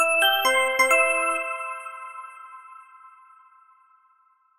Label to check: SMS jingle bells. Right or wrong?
Right